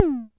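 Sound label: mattel tom, Mattel Synsonics drum kit, lo fi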